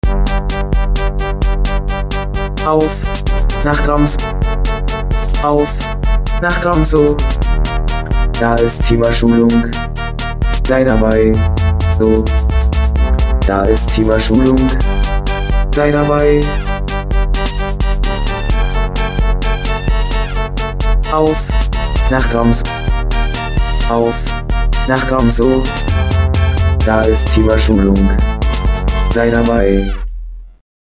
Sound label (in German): Werbesong; T-Schulung